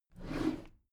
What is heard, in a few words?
packed drawer smooth open regular halfway